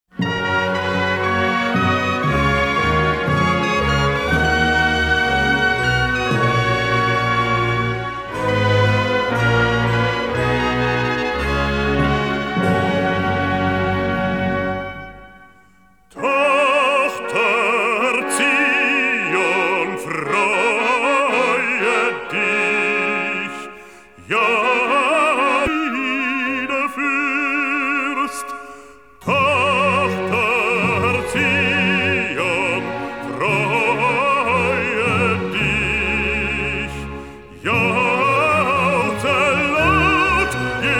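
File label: Arr for Baritone and Orchestra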